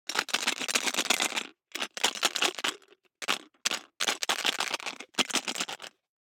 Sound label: DICE in Plastic Cup Closed, Shake, 5 Five Dice; Samples; ArticulatedSounds; DICE Sfx Party (Extended)